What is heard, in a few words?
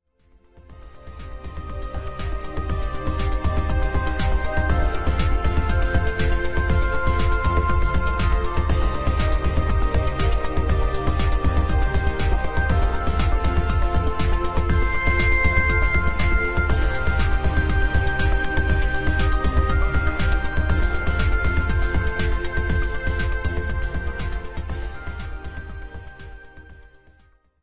industrial; tinellimusica